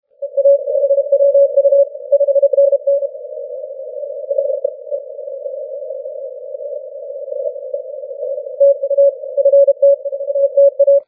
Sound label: 30m cw